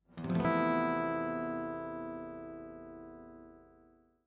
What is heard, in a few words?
The Spanish E Chord